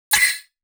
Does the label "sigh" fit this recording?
No